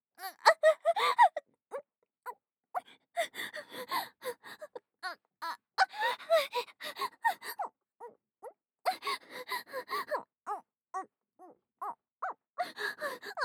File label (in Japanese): 座位喘ぎ, ASMR